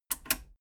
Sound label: metal button radio click power off fast